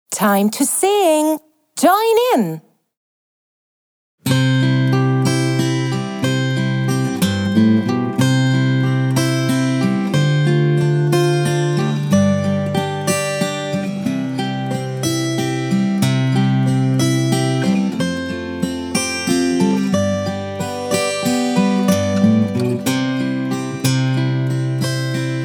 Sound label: song karaoke; ich und du; Schuelerzeitschriften